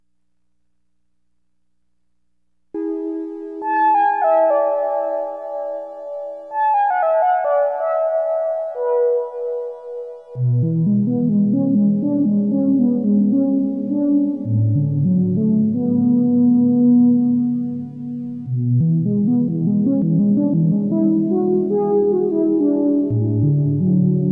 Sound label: vco; Thomas Henry; mankato